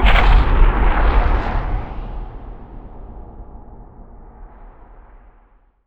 solar flare; weapons; dota; mirana

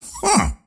whip fail; ringmaster

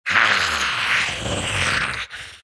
snarl; Dota 2; Undying

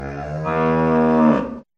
moo; soundboard

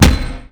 attack; dota; earth spirit

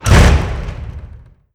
door slam; dota; crownfall

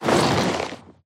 wings; gore magala; mh event

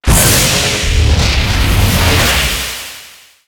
plasma field; weapons; immortal; dota; razor

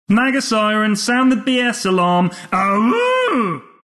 ult alarm; announcer dlc

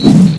preattack; spirit breaker

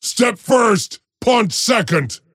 move pain; dota; axe

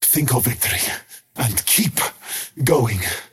skywrath crown move pain; skywrath mage

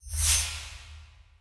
panorama settings slide in; ui

